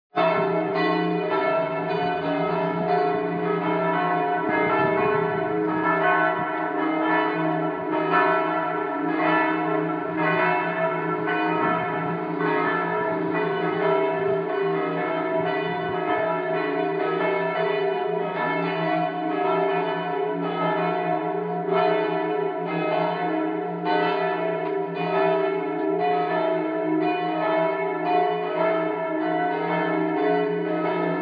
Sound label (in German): Glocken; St-Johannes-der-Taeufer-Erkrath